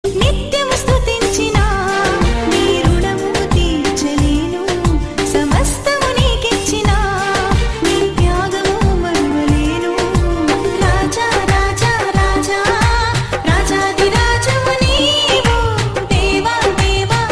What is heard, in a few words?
jesus songs telugu